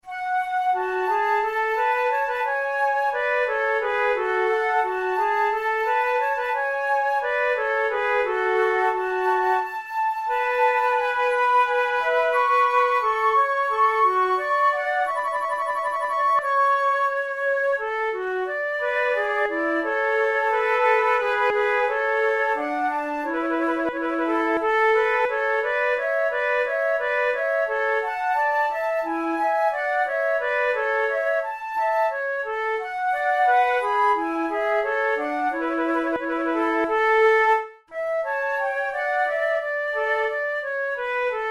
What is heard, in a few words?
sonata for two flutes; in a major; gratioso